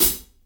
sizzle hi hat B key, pedal hi-hat sound tuned to the B note. Loudest frequency: 9855Hz; drum single hits one shots